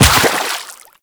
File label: Run Water, Footsteps